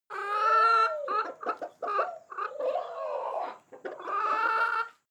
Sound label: hens and pigeon; natur-tiere; huehner